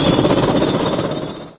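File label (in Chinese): Tank deploy, 女孩进入坦克音效; 部署音效 dep; 海岛奇兵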